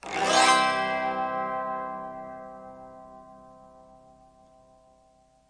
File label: autoharp C, Autoharp Chords; Multisampled Autoharp Chords; WORLD